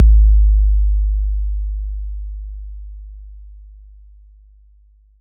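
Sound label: bass drum 808 Tube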